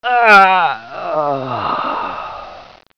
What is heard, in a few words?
die